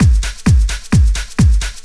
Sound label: straight, Techno Trance Essentials drum loops